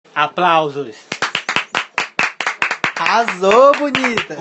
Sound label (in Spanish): aplausos; wttsounds